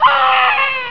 hit

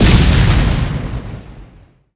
laser

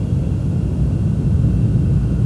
hum

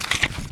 page

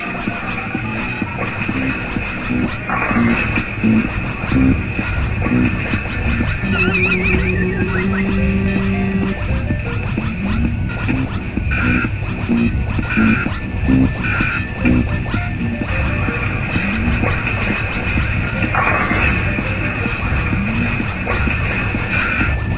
arcade ambient

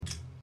timer tick

